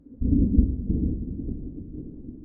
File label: Weather, Thunder, Tropical, Distant Thunder, Booming Thunder